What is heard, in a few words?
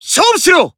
Emo Warcry